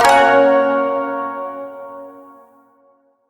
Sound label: Shawzin Hirajoshi Chord H; Warframe